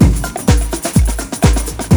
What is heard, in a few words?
FESTIVALOOP, BREAKBEATS